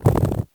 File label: bat flapping; wesnoth